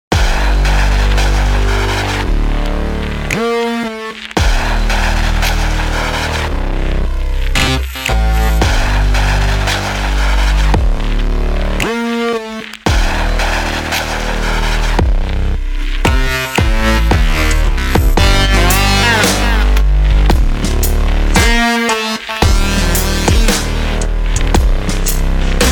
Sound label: Electronic Ringtone